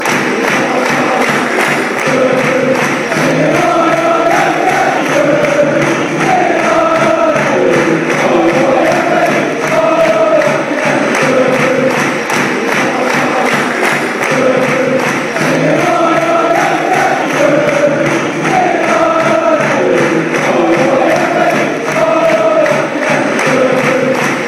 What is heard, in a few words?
singing oy oy; appy appleton